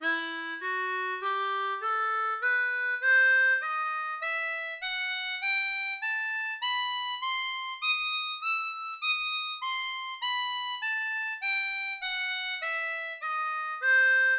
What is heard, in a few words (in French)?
Mi Mineur, Gammes, harmonica